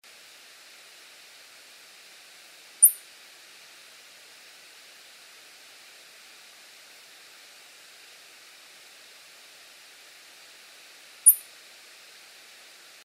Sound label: Siberian chipmunk Euramias sibiricus, Altai; Swetlana Mesheryagina